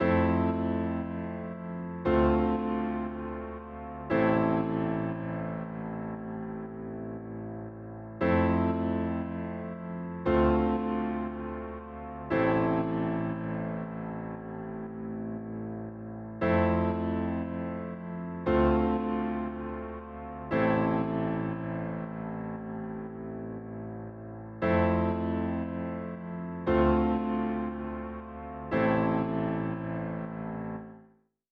117 BPM A Min Piano; Melodies; Loop Stems; Sunset; Cymatics; GHOST (Beta Pack); Blizzard

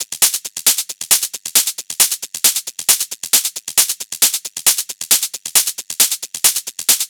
Hat Loop 135, Techno

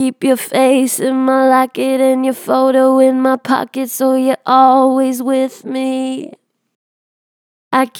Strong Vibrato, Natural Vibrato Strong, Vocals; Harmor Vocals

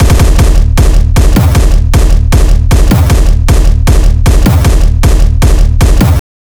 kicks loop 155; GhostHack

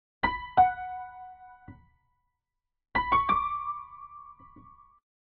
177 BPM B Min Piano Melody, loop stems; Cymatics; 2022 Melody Collection Platinum Expansion - Pt 2; Origami

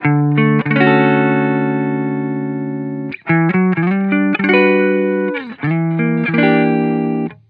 Vibrations Chill Guitar Loop 128 BPM D Maj; Cymatics; Vibrations Guitar Sample Pack

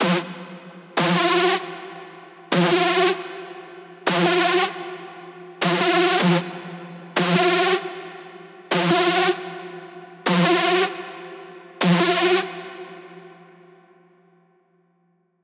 screech loop F min 155; Music Loops; GhostHack

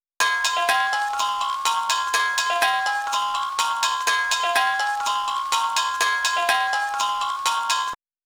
124 BPM F Min Kalimba High; Melody; Loop Stems; Cymatics; 2022 Melody Collection Platinum Expansion - Pt 1; Circus Trick

